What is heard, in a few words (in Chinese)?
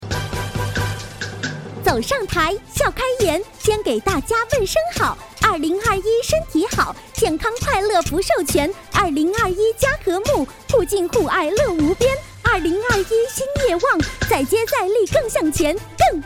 快板 激情